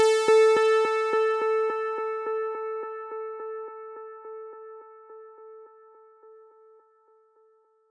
music bleep

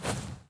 footsteps player grass

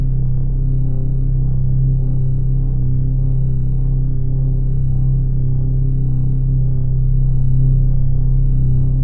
music drone loop

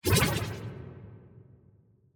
collectable; Enviroment

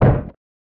wooden collision dry dull; FMOD; Interactables

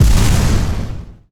weapons explosion medium

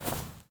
footsteps player grass

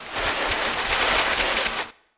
arrow volleys

